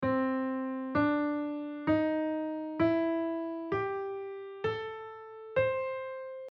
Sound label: C major penta blue note